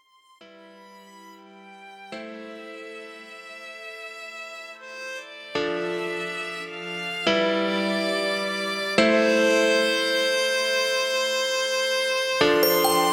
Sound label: Modern Pop Production; This Is Gold: Modern Pop Production